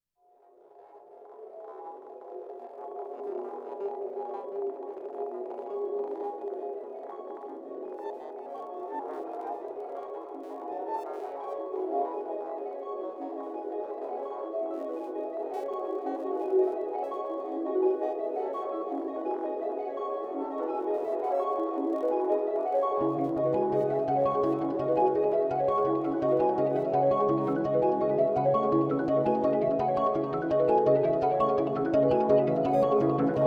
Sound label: Train Electronic